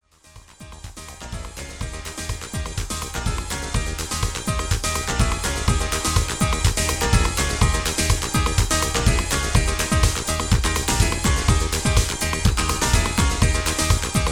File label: Chime Live